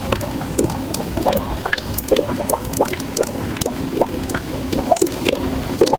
water drip

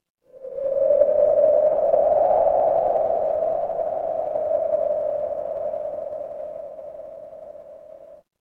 horror wind whisper

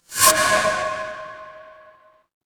transition sweep

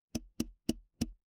glass glass tap

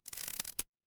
glass crack

